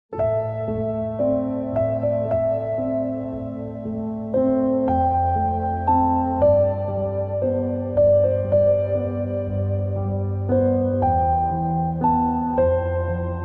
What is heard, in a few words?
Instrumental Sad Music